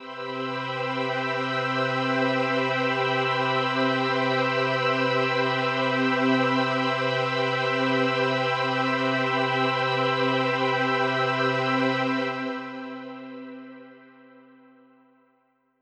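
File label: Ambi String C major 7, synth orchestra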